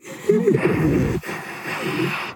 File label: inspect